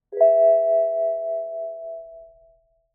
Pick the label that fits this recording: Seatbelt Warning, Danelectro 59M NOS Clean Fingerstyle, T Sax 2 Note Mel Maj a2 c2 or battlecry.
Seatbelt Warning